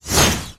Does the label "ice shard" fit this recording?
Yes